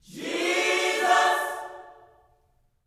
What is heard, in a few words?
JESUS, Gospel